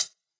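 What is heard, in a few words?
classic hip hop hi-hat; STYLE KITS; Classic Hip Hop Mega Kit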